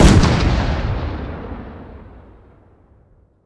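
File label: weapon sniper2 fire player atmo ext; gmod; viper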